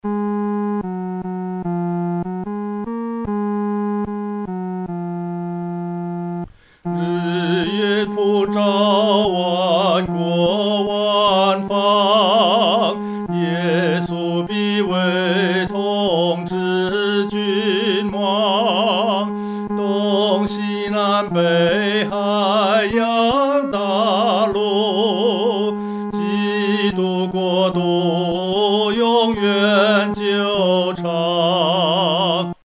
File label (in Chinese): 独唱 第三声; 主治万方